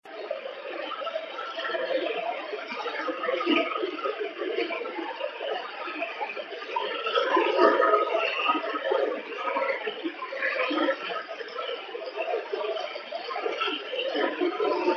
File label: radio anomaly ringing sound